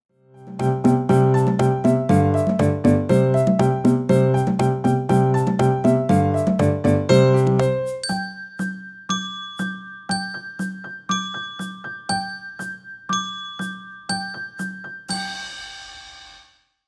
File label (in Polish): deszczyk